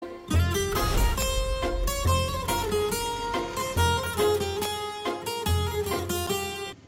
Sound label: love bgm